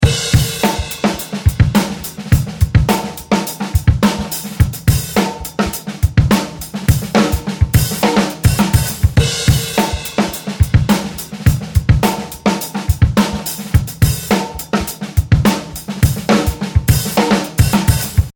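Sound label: 3 step Funk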